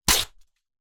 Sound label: Book Paper Single Page Rip